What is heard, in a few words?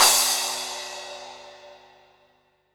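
crash, Drums Acoustic; USB Soundscan vol.10; AKAI; DYNAKIT 2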